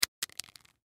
Cracker Drop, Cracker Drop On Table; Food; Household; foley; Blastwave

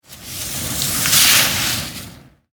Snowboard Pass By; Skiing and Snowboarding; Sports

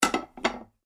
Large Dinner Plates Stacked On Table; Dishware Sound Effects; Household Sound Effect

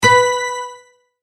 multimedia tone correct; Tones; Sound Design; McKinney Sound

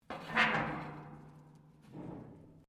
METAL RUSTY SCRAPE

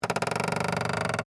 Creak Plastic, Texture, Creak, Plastic; Creaking; Objects; BlastWave

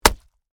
Flesh Chop Impact; Horror; Blood and Guts (Sound Effects:Horror)

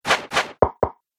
Dagger Throw Double Hit; Knife Sound Effects; combat sound effects

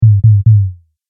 Sub Drop Triple, low impact; Low Impact Sound Effects

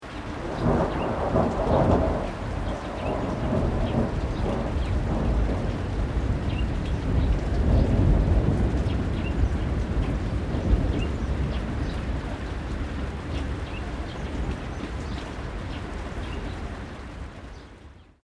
THUNDER DISTANT LOW LIGHT RAIN BIRDS; Elemental weather effects

